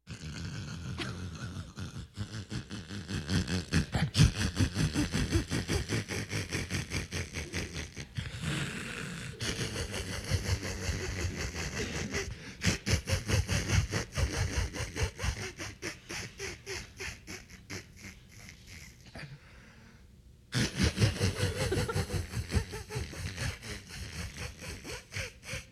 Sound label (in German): heimliches lachen